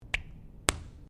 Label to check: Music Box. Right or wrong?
Wrong